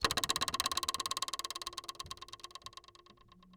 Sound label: Cartoon Spring; AdobeCC